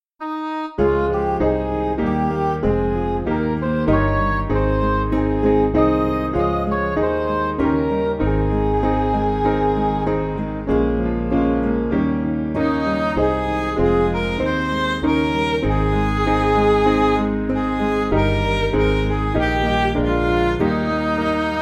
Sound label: Midi; Caneuon Ffydd 163; Downing